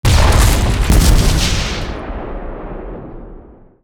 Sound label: ships destroyer damage defend; Hearts of Iron IV Death or Dishonor